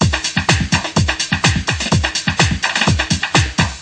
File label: 126 bpm clanger, drum loop